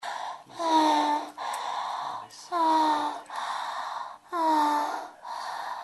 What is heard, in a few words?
moan; Low Blow